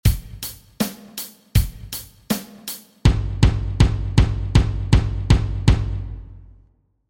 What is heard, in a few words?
fill